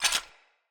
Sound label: Foley Action Metallic Punch; Arm